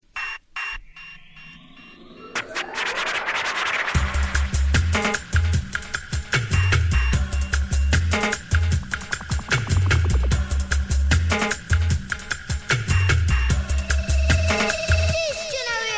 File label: vinyl hardcore; 92-93; live stream